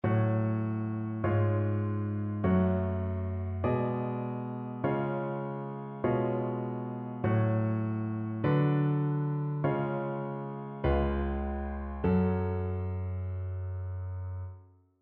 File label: harmonic error detection